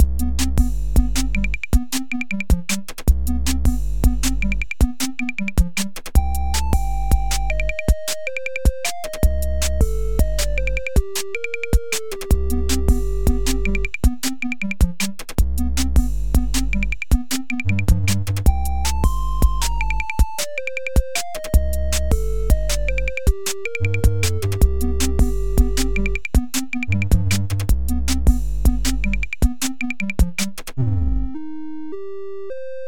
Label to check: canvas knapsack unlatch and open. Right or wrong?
Wrong